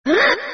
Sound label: Cursed; yet another pixel dungeon android